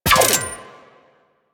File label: Fire Mech, Weapons Lever Action Rifle; Warframe; Tenno; Sybaris Prime